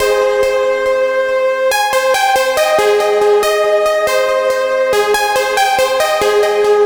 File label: Small Melo, техно-транс